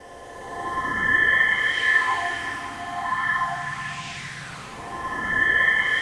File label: PAD, DIGI-PADS